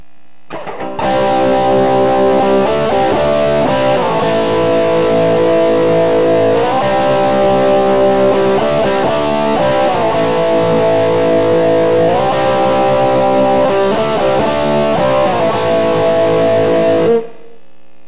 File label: bridge crunch; phatcat; Burny